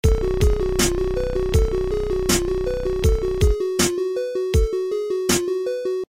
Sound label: lose; Adventure Time - Break The Worm; ThrillGames